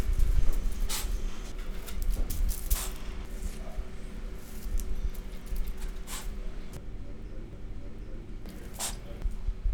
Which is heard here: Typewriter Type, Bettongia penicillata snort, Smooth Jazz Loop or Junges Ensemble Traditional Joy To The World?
Bettongia penicillata snort